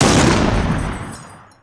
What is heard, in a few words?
axis anti air; WAR